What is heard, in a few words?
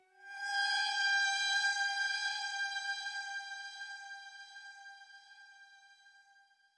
Suspense Transition, Horror Sci-Fi Music; Dead Ark